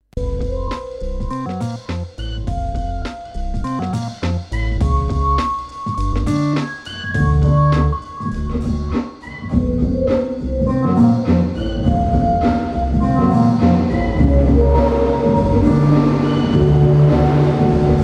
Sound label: Numark v7 reverb